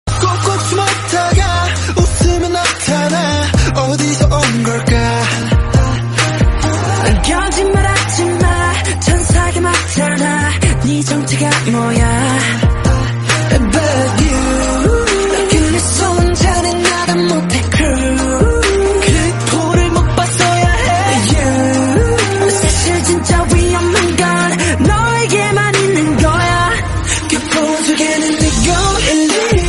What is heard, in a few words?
korean song